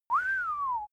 male wolf-whistle; soundpool

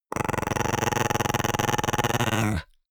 chihuahua growl creak

